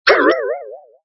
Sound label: fight fold; Tongits